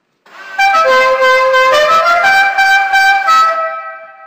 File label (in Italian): Clacson; GENERAL LEE